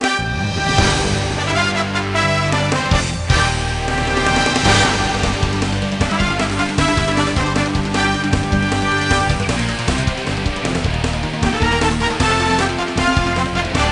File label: Menu Music; ESPN College Hoops; ps2 xbox gamerip 2003